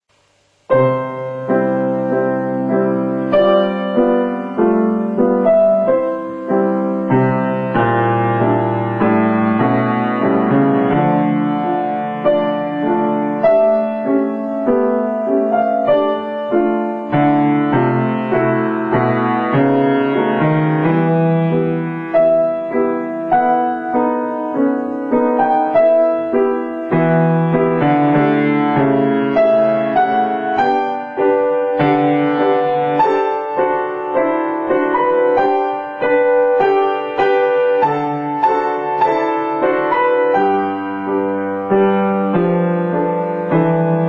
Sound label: melody